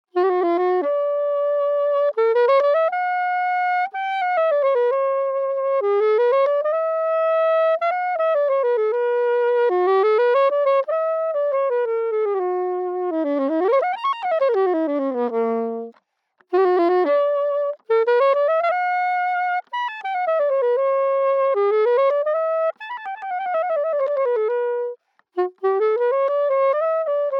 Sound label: Sop Sax lo bass